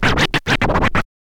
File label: scratch kit, vinyl